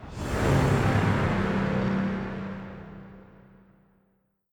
Outtro Stringer

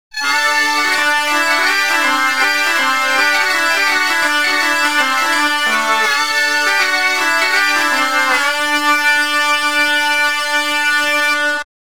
Bagpipe Tune; Spyro the Dragon 2 Ripto's Rage

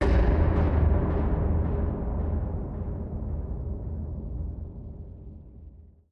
Die; Dead to Rights: Retribution

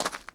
Foot Gravel Shoes Walk

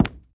run wood

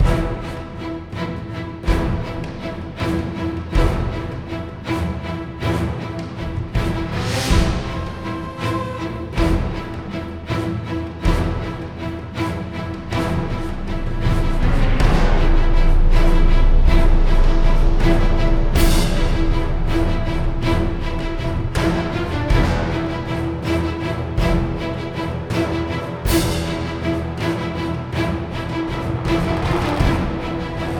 Fight Theme Tension; ryse son of rome 2014

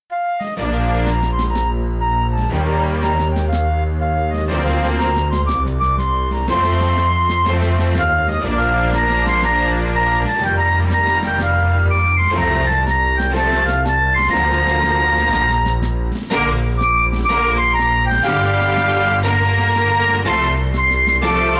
Scroll Tune; Civil War General; Windows

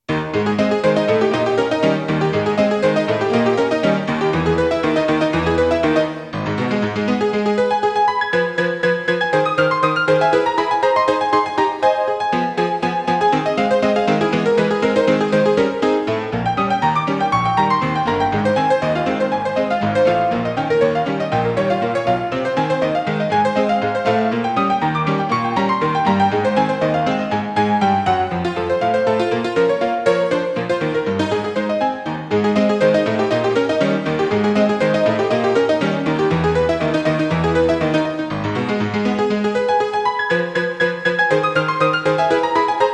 Player Piano; the colonels bequest 1989

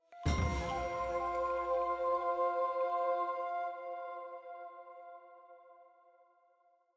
item Jingle Full + Choir Flute F

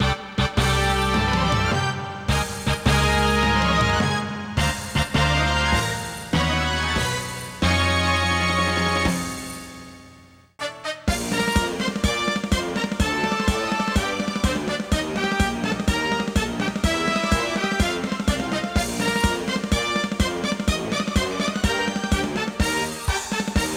All Clear Fanfare

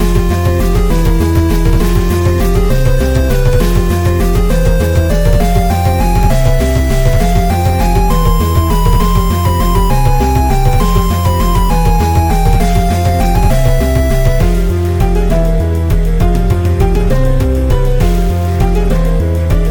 Air Waltz; horace ps4 switch windows xbox one gamerip 2019

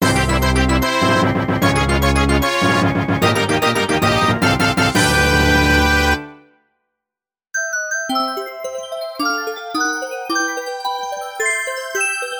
Final Boss Clear; New Super Mario Bros.

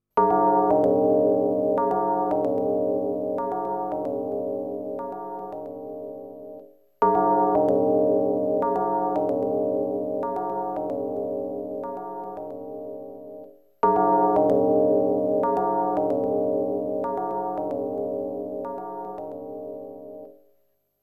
Bell; Ys II; Music from Ys II

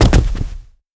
jump from window

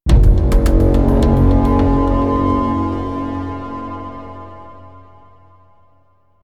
UI Stinger Complete; starcraft 2 wings of liberty

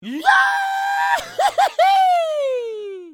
Pig laugh; bad piggies; android; mobile; windows

